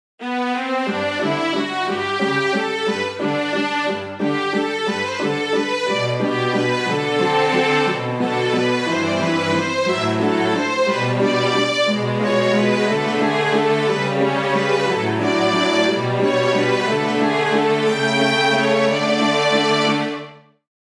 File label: Serenade for Strings 2nd movement